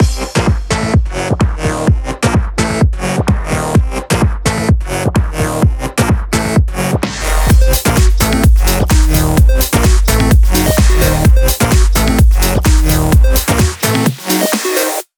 128BPM Mix; Vengeance Samples; VFH1 128BPM Dogde Kit

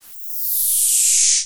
Reverse Cymbal; Cymbals; Vengeance Samples

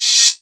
Ride, Cymbals; Vengeance Samples

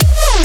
drop

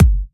Soft Kicks; Vengeance Samples

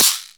Open Hihat; Vengeance Samples; VEE2 Cymbals

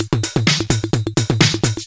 Houseloops 128BPM; Vengeance Samples

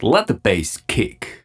Vocal Phrases; Vengeance Samples; Vocals

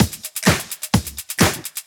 Housebeats, 128BPM; Vengeance Samples; VEH2 Loops

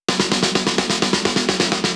Fill 128 BPM; Vengeance Samples; VEH1 Loops

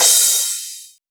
Crash, Cymbals; Vengeance Samples

